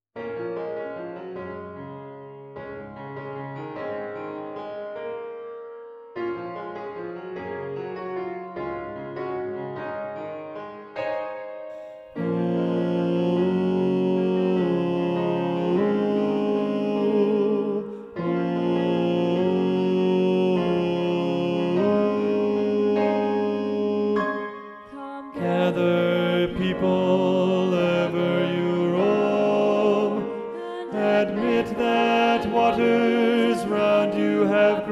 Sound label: Tenor; Practice Room